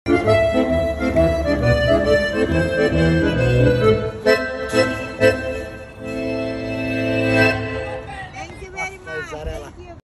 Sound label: Concertina; کنسرتینا